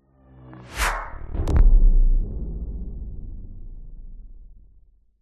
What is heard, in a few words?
sound design cinematic whoosh into sub drop hit electronic